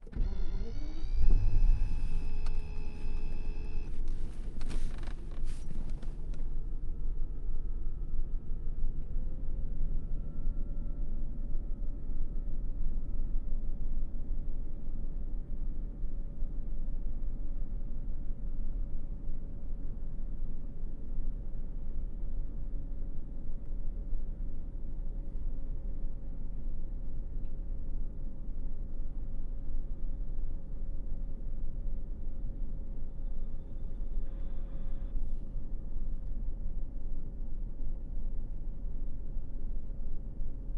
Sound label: bmw 760i interior start idle stop; sonniss gdc